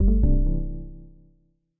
Lose; MiSide

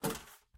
Ladder Hand; Game rip; MiSide

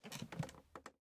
Stool Stop; MiSide

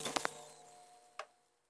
Monitor Off; Game rip; MiSide

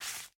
Hide Cards; MiSide

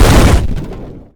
Rocket Explode; MiSide

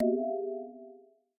Error Apply; Game rip; MiSide

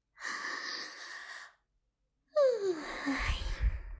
Yawns; game audio; Mita; MiSide

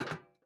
Step Iron; MiSide

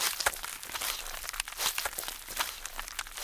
Door Toilet Meat; MiSide

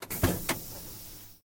Lift Door Open; Game rip; MiSide

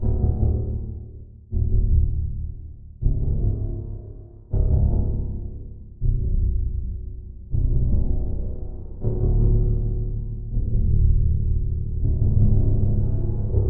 Ambient Evil; Game rip; MiSide

